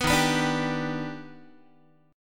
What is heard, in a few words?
Eb Augmented Major 7th Eb down Guitar; Eb+M7 strummed; 14,11,14,12,11,x; JGuitar